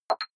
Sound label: click fluffy chink